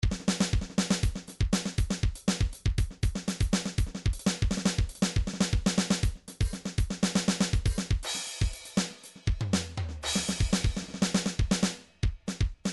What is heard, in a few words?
drums percussion, midi; midi vol 4 drums percussion